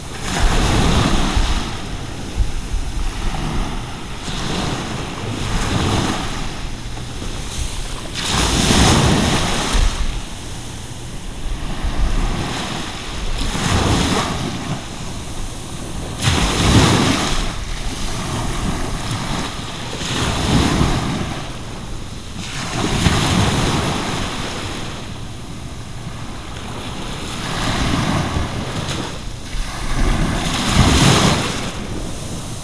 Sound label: ocean waves; sasquatch